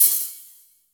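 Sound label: SMOOTH open hi-hat; Drums In Your FACE!; BONUS HATS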